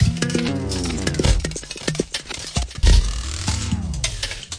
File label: 105 spring wound abstraction, sampled music loops